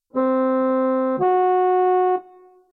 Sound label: organ interval